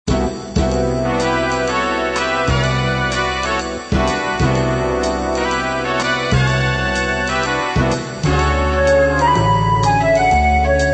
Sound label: triad